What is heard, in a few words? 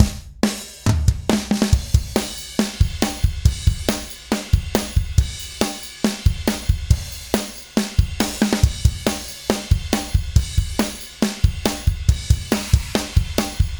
Drumssolo